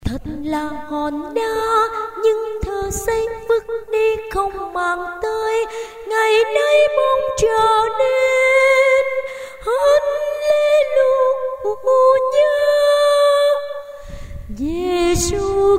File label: tenor